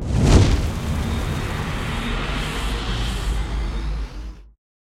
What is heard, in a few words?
near Win; netent